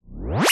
cartoon ascend fast beam up

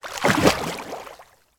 water object submerged with bubbles; sound effects one

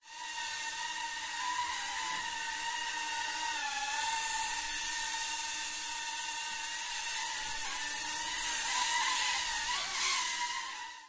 Drone DR1; fox audio

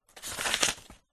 household book old well read thin page turn; zapsplat

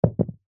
battery aaa drop carpet; zapsplat

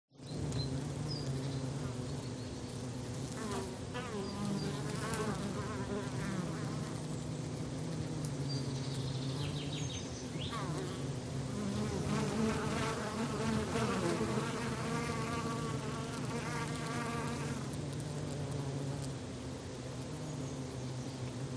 ATMO NATURE Countryside Many Bees; smartsound

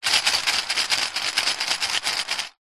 leisure game plastic discs shake in plastic tray; connect 4

